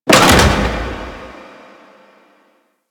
Gas Storage Container Lower Stop; Ambience; Warframe; Corpus Gas City

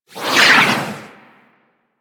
Sword Projectile By; Doom Sword; Warframe; Excalibur

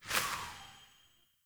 Sparrow Death State One Wing Flaps

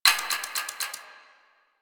Hack Minigame UI Time Tick F

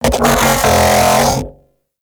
Mech Ambient Vocal

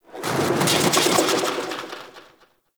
Metal Scrapes; Ambience; Warframe; Corpus Railjack

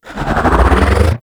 Bow Draw; Stalker Bow

